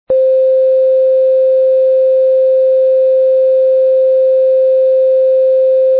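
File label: C natural 2, recorders; tuning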